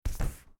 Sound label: moving item; Nook BNTV250; ui